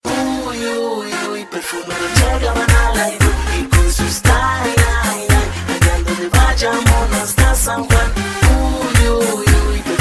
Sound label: remixx ringtone